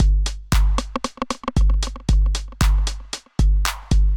909 115 Beat, Drum Machines; Alteration; Ableton

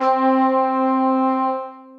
Dual Tubas; Bold Brass; Multisampled

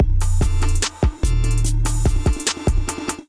Watcher Kit groove 73 bpm; MIDI Clips; Ableton; Factory Packs; Beat Tools

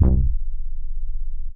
Essential Bass, Synth

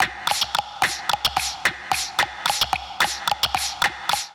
Vowelizer Kit 110 bpm, Voice Box, Percussion; Ableton; Factory Packs

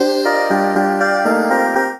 Complex Vocal Racks (Root G); Velocity Cords; Voice Box; Harmonic Vocal Racks

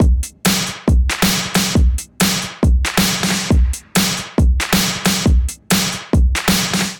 Kit 137 bpm, Drums; MIDI Clips; Bow Road Kit; Ableton; Factory Packs; Skitter and Step

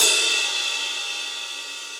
Ride Mid Reso Classic; Drums; Drum Hits; Standard Hits; Ableton; Factory Packs; Session Drums Club

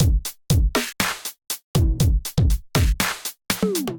Slappers Kit, Drums, Hybrid; Ableton; Factory Packs; Drum Essentials

